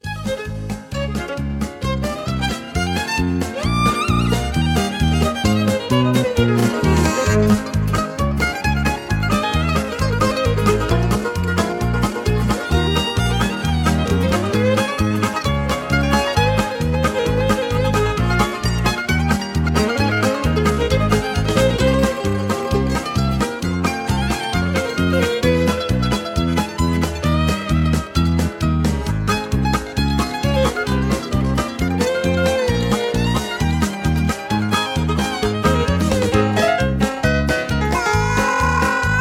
Instrumental High Key

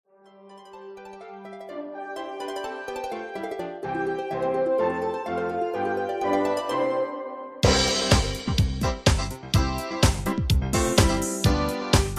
instrumental

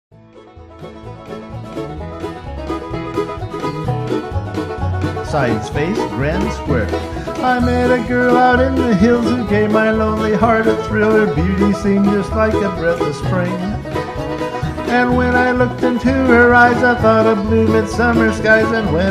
Vocal no Harmony

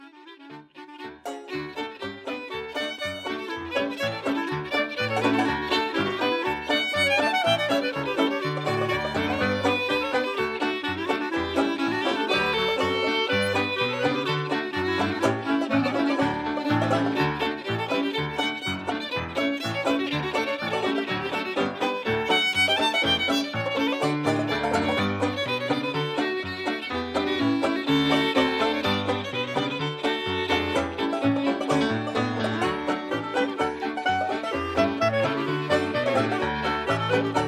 Instrumental

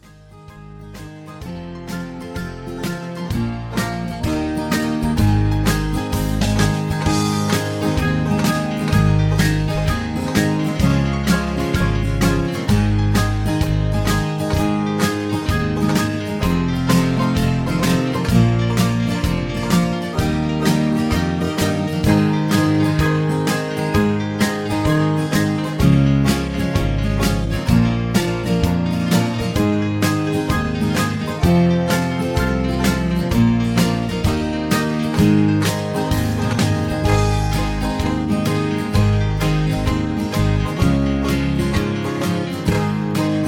Instrumental Higher Key

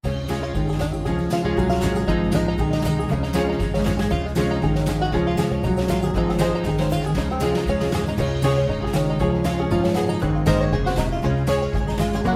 Low Key

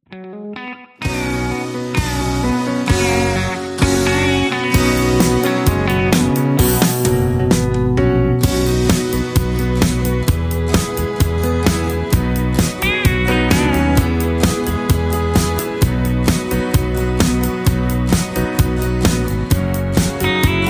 Instrumental No Leads

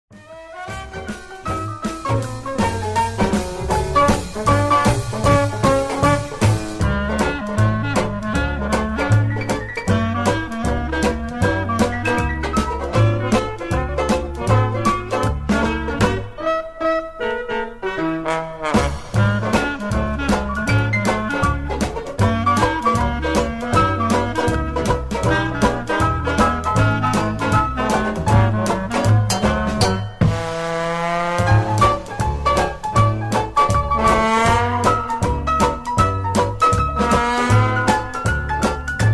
music slowed

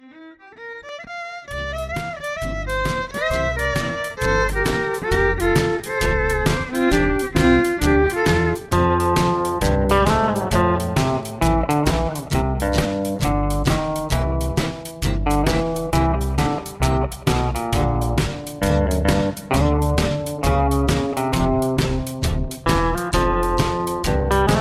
Instrumental Key of C

